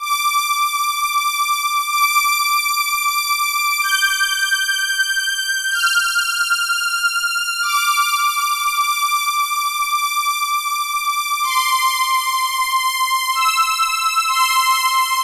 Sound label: 126 D Solina Synth